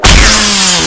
bat away, manhack; hl2

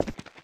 concrete, player footsteps; hl2

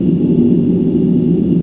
pipe, air exchange; hl2

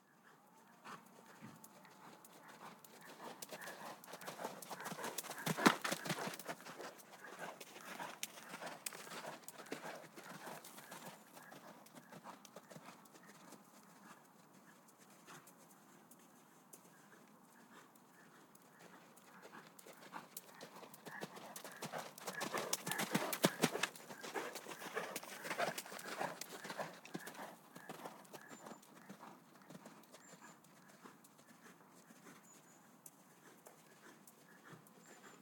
HORSES - Galloping outside in sand; G4F SFX

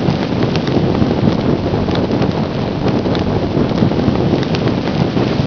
burning, fire; hl2

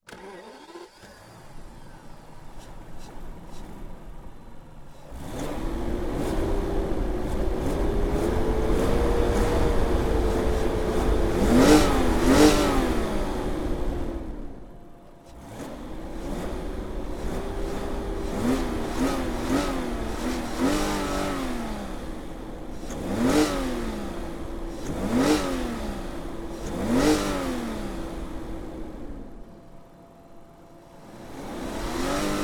BMW 760i Engine Start Very Strong Revs Stop; FLYSOUND

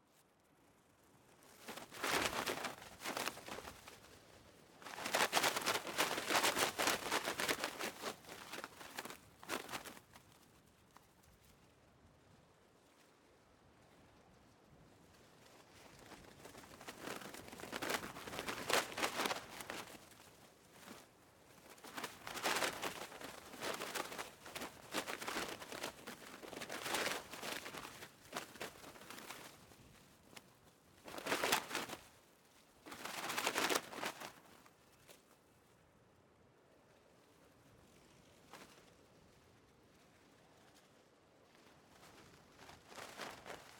Wind, Ext, Urban, Plastic, Thin, Flutter, Violent; Mono; Hzandbits

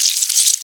squirm, headcrab poison; hl2

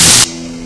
spark, electric; hl2

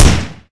ar2 fire; hl2

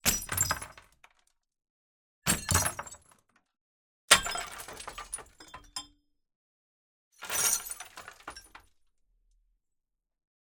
SHARDS - Drop, Glass, Table, Metal, Debree; MS Stereo; Double Trouble Audio

